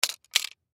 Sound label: Sun Glasses Down, Sunglasses Set Down Cement; Objects; Foley; Blastwave